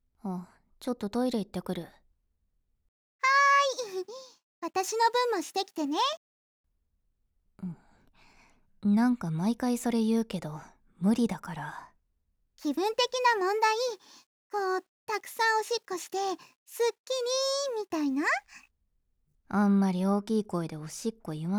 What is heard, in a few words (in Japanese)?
鼻歌